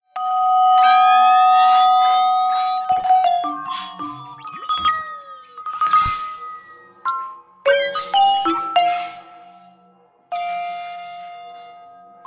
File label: vibes